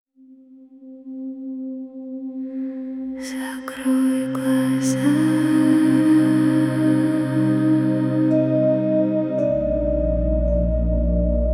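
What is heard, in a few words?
sleep mantra; mantry affirmacii; mantra sna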